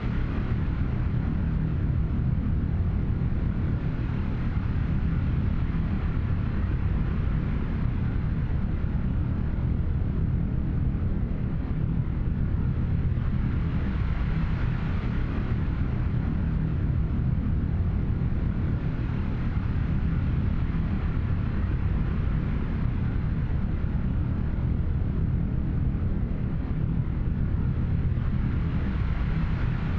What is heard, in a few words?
ship colony ship idle; Stellaris; machineage